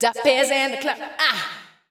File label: VOICE GRL HOUSE 125 A, House Voice